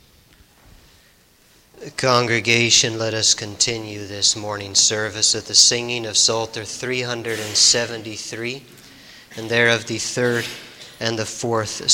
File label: Singing